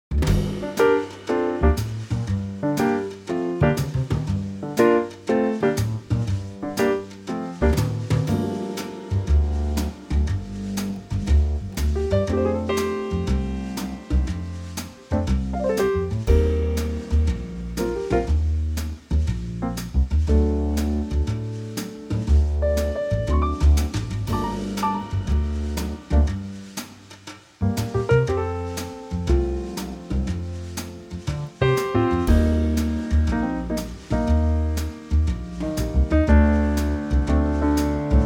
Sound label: Gm Trio